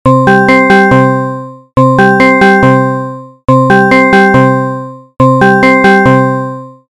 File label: tone; Clock